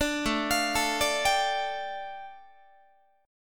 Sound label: A 7th Suspended 4th Sharp 5th A, Guitar; A7sus4#5 arpeggiated